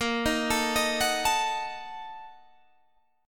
Bb Major 7th Suspended 4th Sharp 5th, Guitar, Open G